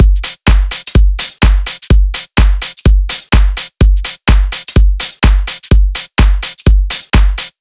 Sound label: 126BPM Drums; Vengeance Samples; Inception Kit